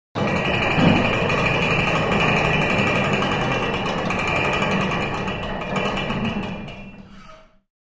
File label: Main Gate Chain Mechanism; Maingate With Chain Pulley Mechanism Closes Rapidly Chain Mechanism Only, Reverberant Interior